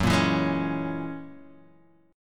Gb 9th Gb

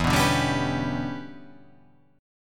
E Minor 13th chord

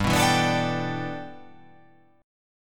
G 6th Add 9th G, chord

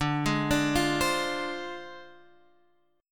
D Minor 7th Flat 5th

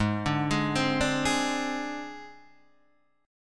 Gsharp Major Flat 5th; G#Mb5 Chord {4 5 6 5 3 4} arpeggiated

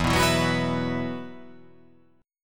E Suspended 2nd E, down

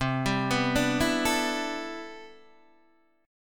C Major 13th C, Guitar DADGAD

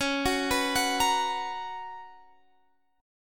B Major 7th Suspended 2nd, chord

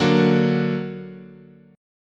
D Augmented, chord

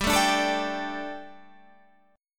G Suspended 2nd G, down, Guitar, Standard; Gsus2 strummed; JGuitar

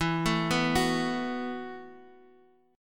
E Suspended 2nd Suspended 4th E, Guitar, Drop D; down

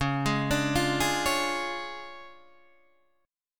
D Minor Major 7th Flat 5th, Db

